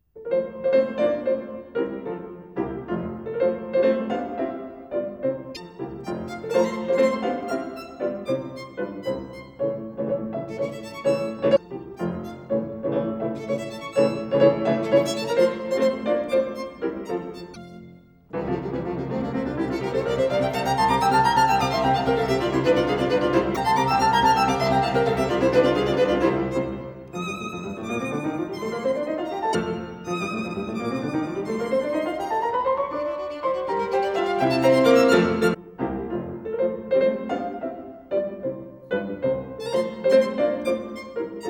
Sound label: Sonata for Violin and Piano No 5 in F Major Scherzo; Carlos Moerdijk; Emmy Verhey; Allegro